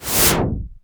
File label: cinematic impact wipe